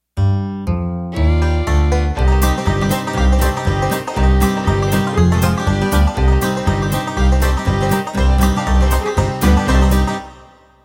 BlueGrass Intro

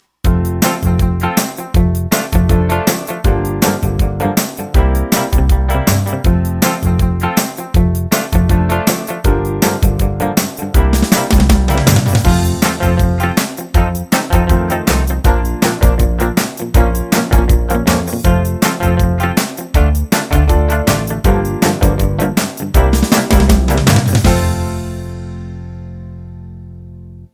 60s pop

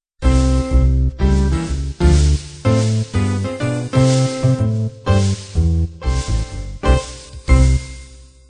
jazz quintet end

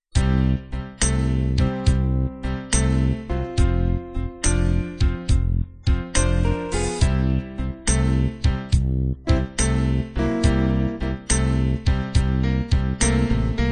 slow blues

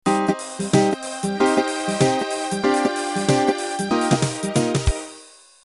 Swing Rock Intro

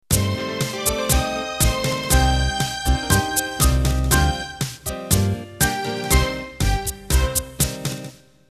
Tango Ballroom Intro; Norton Music

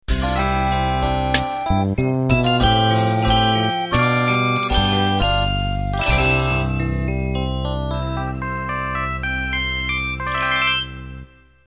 Animation End

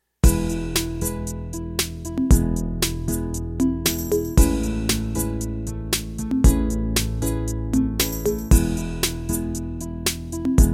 8 beat analog

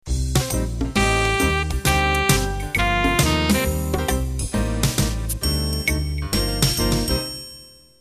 Club Jazz Intro

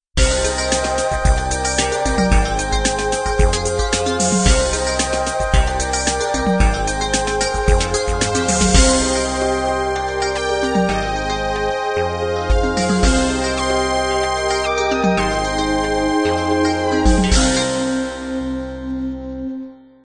16 beat analog end

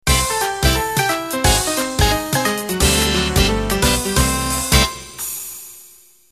Flapper end

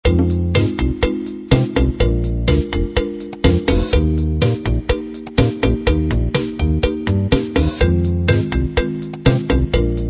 cha cha

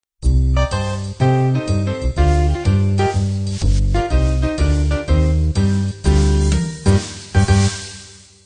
jazz quintet intro

